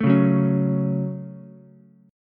C sharp Suspended 4th Sharp 5th C sharp Ukulele, chord; strummed; 2,1,2,4